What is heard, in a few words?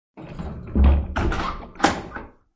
Sldng Stbl Dr, Stable door slides shut; Sliding Stable Door; Barn Door Sound Effects; Sound Effects:Doors